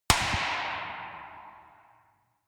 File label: gun slapping pop gun ii; schusswaffen